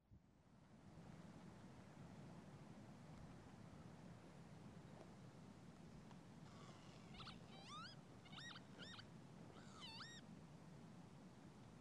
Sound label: bird sounds